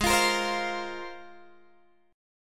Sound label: G sharp 6th Add 9th G sharp Guitar Open G